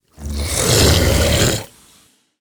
Ravaging Ghoul Play

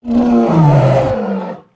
Death

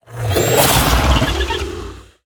Snarling Vilefiend Play

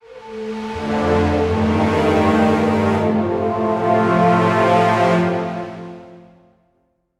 Stinger; Anachronos

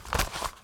Scroll Play

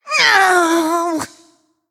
Female Undead Death

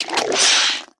Pantry Spiders Attack

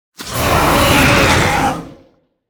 Hydra Death; Bittertide

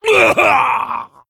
Orc Emote Death

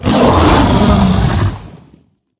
Winged Guardian Play

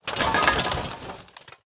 Equipment Debris Underlay Attack

